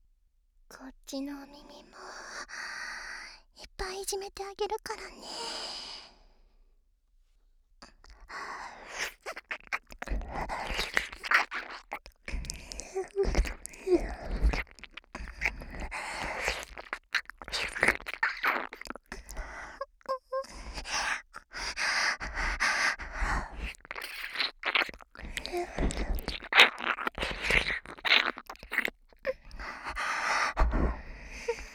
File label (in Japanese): 荒い息耳舐め, ASMR